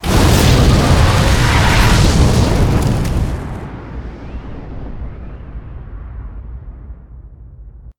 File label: explosion large